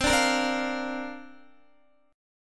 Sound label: C 7th Suspended 2nd Suspended 4th C down Guitar; C7sus2sus4 strummed